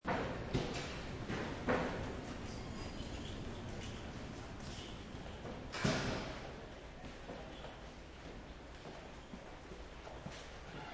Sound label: Loop ambience recording inside a music school pratice room, various students pr; Loop ambience recording inside a music school pratice room, various students praticing their instruments and air conditioner humming; Classroom; Amb Musroom; Classroom Sound Effects